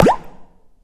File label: bucket pop Up; sweet shuffle